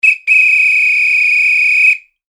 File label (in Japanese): 笛 ピッピー